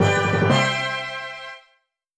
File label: Jingle Comic End